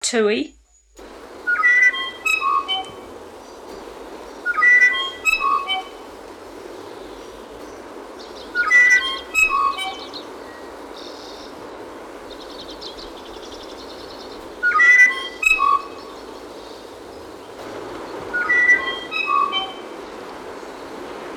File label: tui invercargill